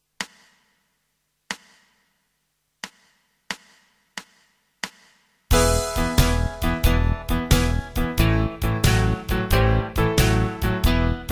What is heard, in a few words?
Blues, Guitar backing